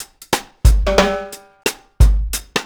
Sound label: RG90 DRUM, REGGAE